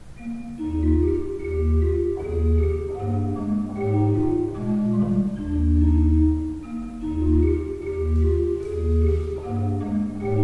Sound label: Graf, pipe organ